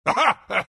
bearform laugh; dota; lone druid

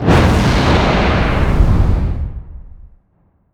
flames; weapons; dota; nevermore

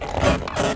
creak, movement; snapfire

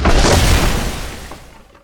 critical strike; weapons; dota; skeleton king

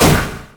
projectile impact; weapons; dota; shadowshaman

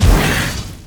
attack; witch doctor

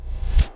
psi blade; templar assassin

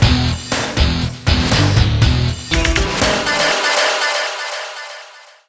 taunt; dota; ancient apparition

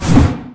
pre attack; weapons; dota; huskar

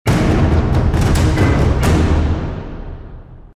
music stinger; stingers

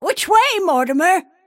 move pain; snapfire

